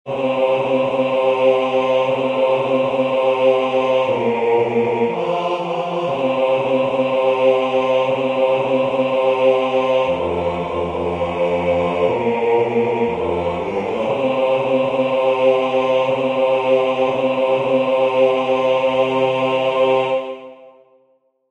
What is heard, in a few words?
Intro bass